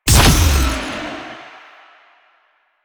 Power Slide Boost Ready Stinger, Motorcycle; Motorcycle Ninety Nine; Bullet Jump

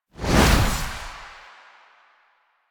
Fuel Projectile Throw; Warframe; Jade Shadows; Ascension

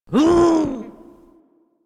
Injured Grunt

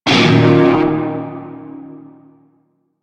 Shawzin Blues Chord G; Music; Warframe; Narmer Shawzin

